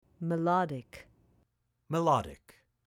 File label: Melodic